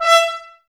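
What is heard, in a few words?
trumpets E, brass; 90 s Sample CDs; Miroslav Vitous; Woodwind Brass Ensemble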